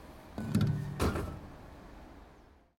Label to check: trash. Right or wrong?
Right